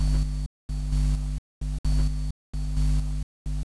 Rythmic Noise 130BPM; layering

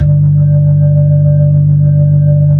B-3, Blues; Keyboards of The 60's and 70's

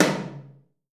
TOM 1L R, DRUMKIT

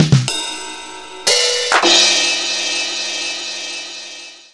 Yamaha RX11

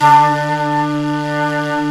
Flute, Singin' Flute

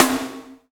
SNR, Snares; Roland L-CD701; Snares 6; Sn Modules 6

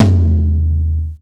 Tom, breakcore Drum Hits

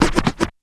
scratch; E-MU Formula 4000 Series Vol. 5; Protozoa; Orbit Instrmt

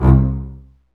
STR, Cb Bowed, Cb Marcato; Roland L-CD702

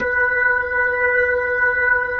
B-3 Jazz, keyboards

